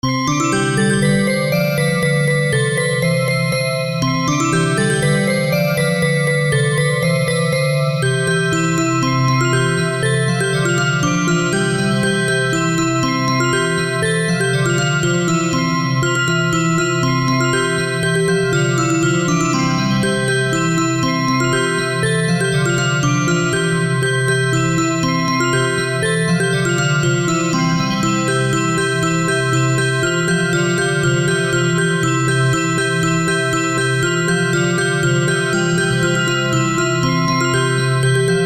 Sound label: bell